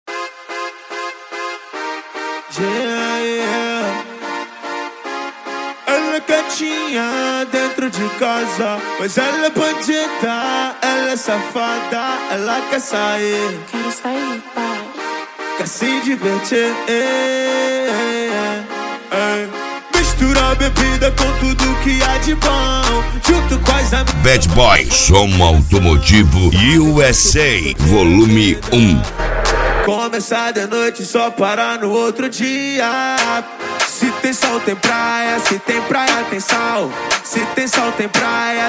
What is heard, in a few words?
especial funk; som automotivo; bad boy; usa